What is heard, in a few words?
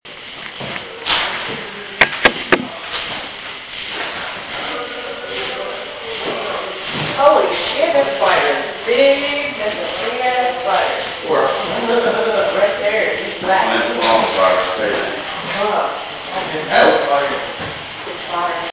crazy singing